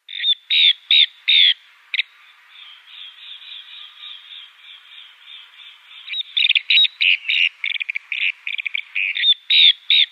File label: Little Green Bee-eater